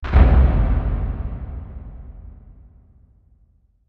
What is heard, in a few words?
Bass Impact Low Drum; impacts